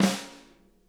snare rim, drum kits

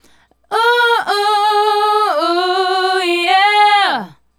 Female Group, Vocal

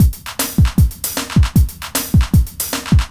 LOOP, Eurotech; Sounds Good

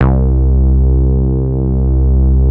MS-20; Sound Gallery - Charged; BASS BANK 1

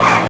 Racing Airplanes; Rocketeer

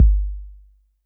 Roland 808 BD; Drums; Tr-808

